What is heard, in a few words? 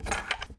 key; Mafie 1